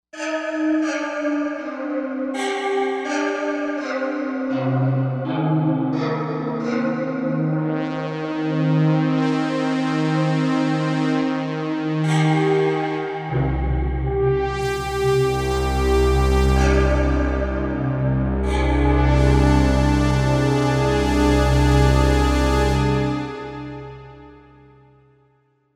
Korg M1Rex; Factory Patches

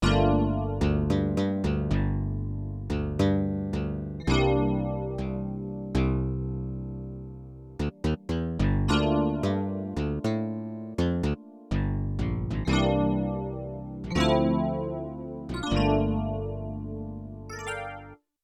Bass EP Split; Combo Performance 2

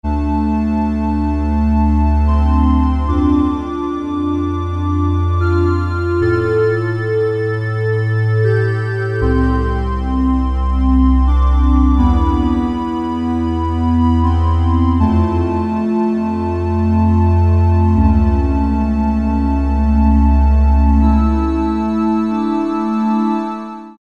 SUB Sq Pad; IK Multimedia; SampleTank Free

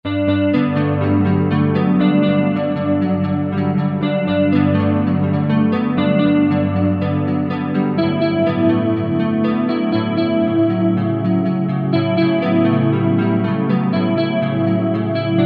Slo Chorus Gtr, Kurzweil K2000; Kurzweil K2000 V3

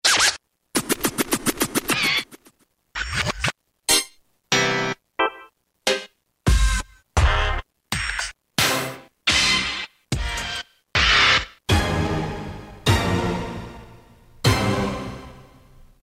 Scratch; Roland M-DC1

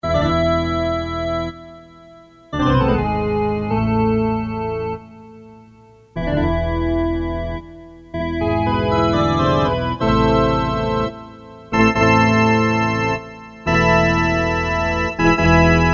Pipe Organ, Casio CT-370; Tones

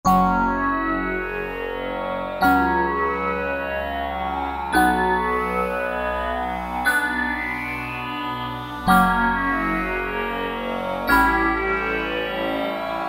Waves On Wheels, Korg Wavestation A-D

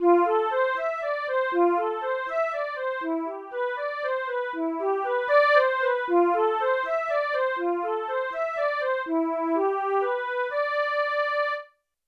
Chorus Flute; Yamaha TX16W

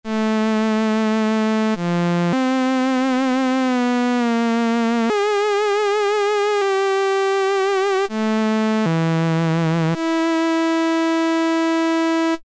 cello, Korg M-500 micro-preset

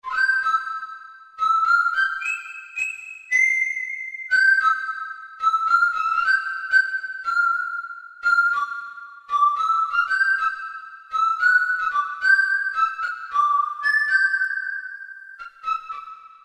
Whistling Soldiers, Roland PN-D50-01

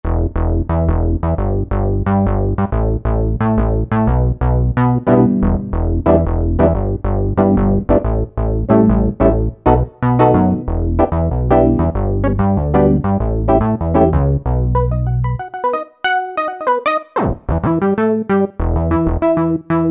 syn, E-mu PK-6; CZone

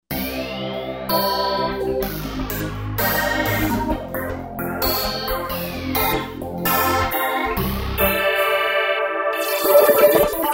Roland V-Synth; Cutting Edge; Factory Patches

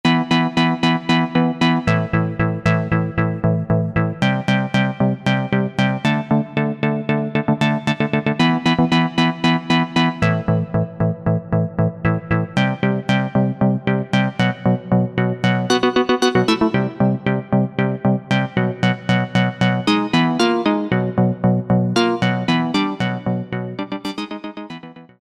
QUINT SEQ.; Best Service Alpha Dance II

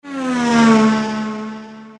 Car Pass, Roland SC-50 Sound Canvas; Factory sounds

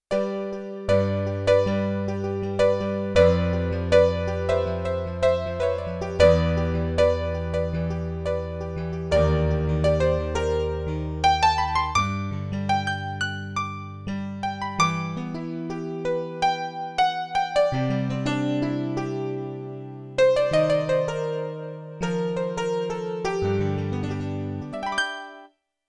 Piano Rhodes; General Performance

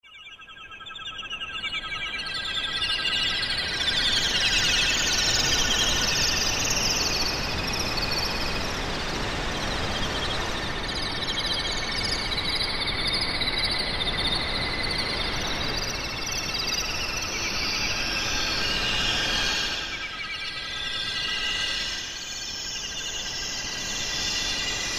Tropical Forest, Korg Wavestation A-D

